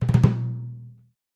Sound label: Timpani Hands, mallets timpani